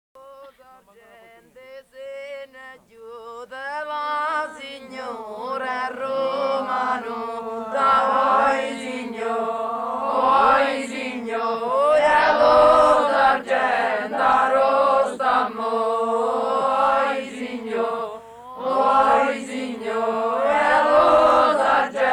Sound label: Montemariano di Avellino village chorus; Lo Sargende; campania